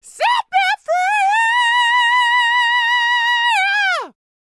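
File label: falsetto, R&B Dance; Spectrasonics Vocal Planet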